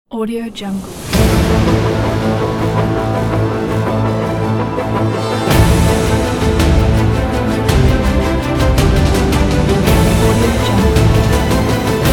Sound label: hero epic logo